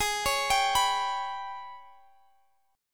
G sharp Minor Major 7th Double Flat 5th, Guitar Standard; G#mM7bb5; arpeggiated; x,x,18,18,20,19; down; capo=3